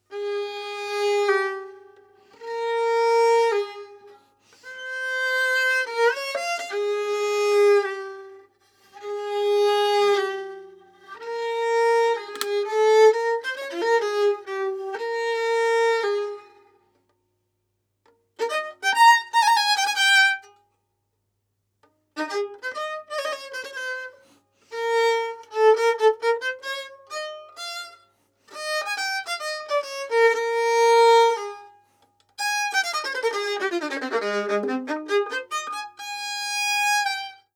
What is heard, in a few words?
Baroque Bow Bridge Below f holes